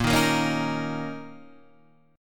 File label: Bb Minor Double Flat 5th Bb, Guitar, DADGAD; 8,6,8,6,6,8